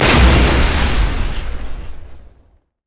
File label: hammer smash; LoL; taric